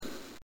dog kiss

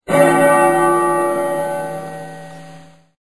BELL

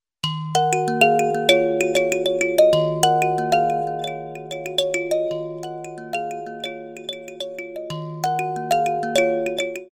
Grand Kalimba

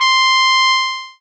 Trombone C5

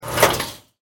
Enter Transport

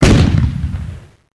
howitzer

